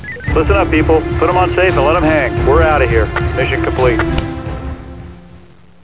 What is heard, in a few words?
human survive; zombie plague